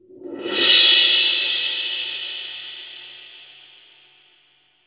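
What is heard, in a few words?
18 mallet, cymbal; Northstar - New Gold VOL-2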